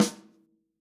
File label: Yamaha Holz L hand